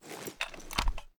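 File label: sniper reload empty extended mag end; gmod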